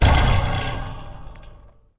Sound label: Spring Touching